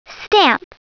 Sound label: stamp